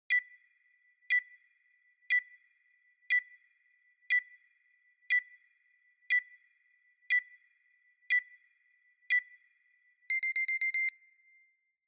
Countdown

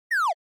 SNES RPG Jump

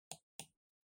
PC Mouse Far L

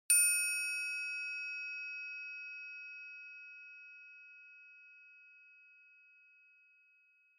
Triangle Single Long

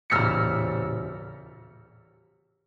Piano Short Reverb

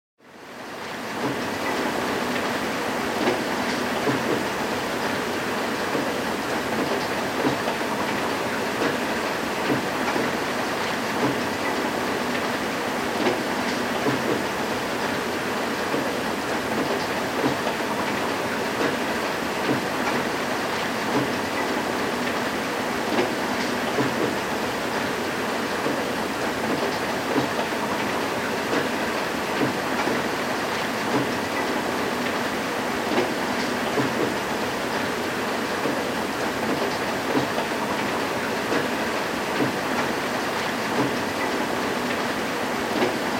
Rain Real Ambi

